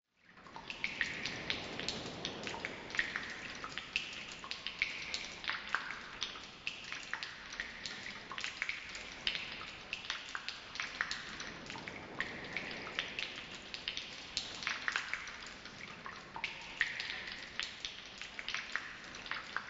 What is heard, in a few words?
Ambient Caves; Star Fox Adventures; Cape Claw